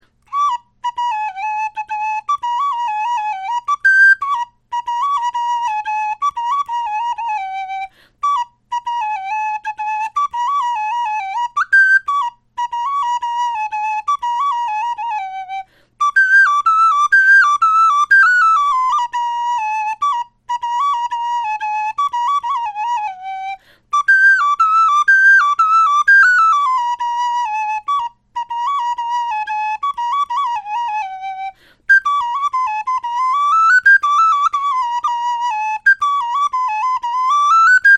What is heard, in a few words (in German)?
melodie, knochenfloeten